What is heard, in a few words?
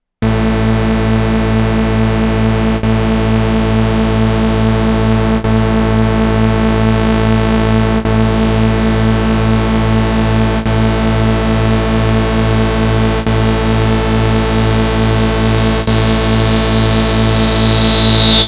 Korg Volca Bass Filter + Rez